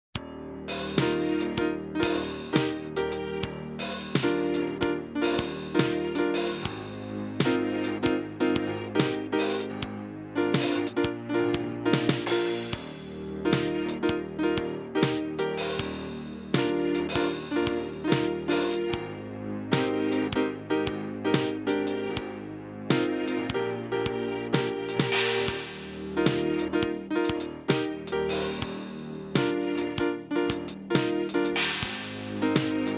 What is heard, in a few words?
victory; Midi Pack 2 (27 New Tunes)